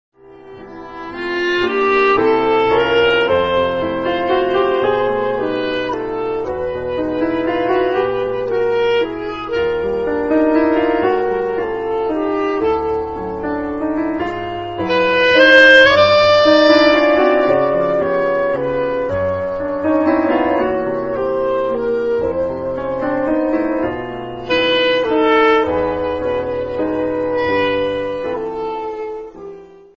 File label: Waltz